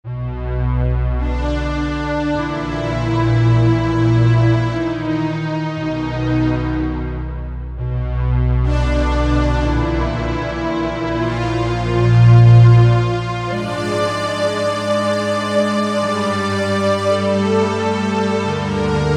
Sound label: 2 voices; Korg MSC-12S Synth 3